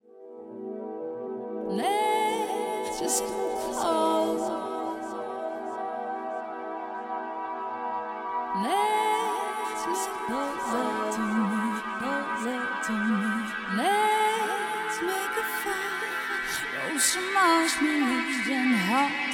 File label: chillout downtempo mix; Soothing Reverie